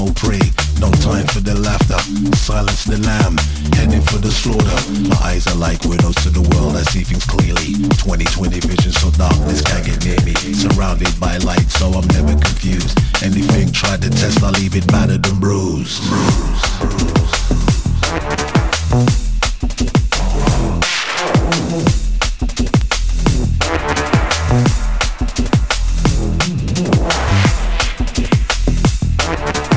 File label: break whispers